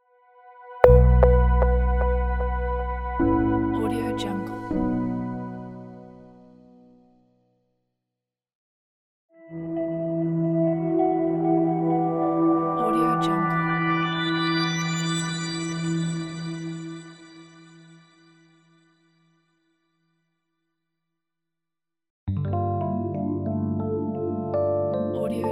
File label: clean elegant logo